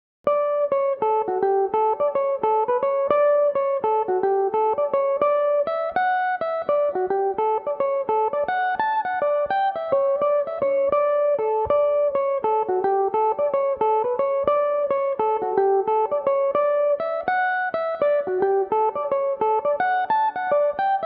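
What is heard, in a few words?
D major, mandolin; The Remembrance Of Lost Liberty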